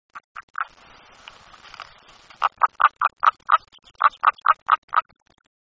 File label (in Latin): Pelobates fuscus; voice